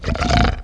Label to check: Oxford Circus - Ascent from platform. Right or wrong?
Wrong